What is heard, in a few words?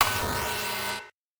breakbeat